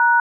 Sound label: dtmf # tone; blink-qt